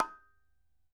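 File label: KHOL HEAD, DRUMS OF IND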